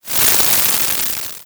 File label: Transition Feedback Distorted